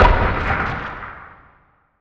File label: asteroid damage oxide big; Factorio